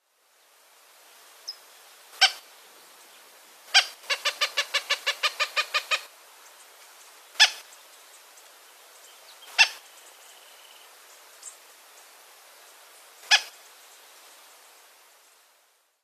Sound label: coopers Hawk